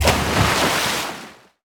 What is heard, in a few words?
cannon miss